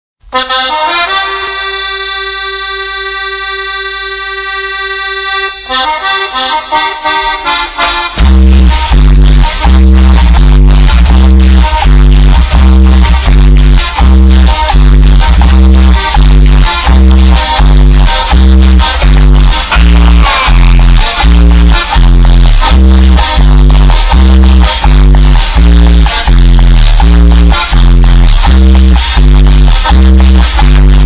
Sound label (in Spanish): cumbia mamalona; Tsurus tuneados